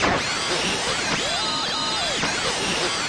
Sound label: radio noise; Radio Tuning Noises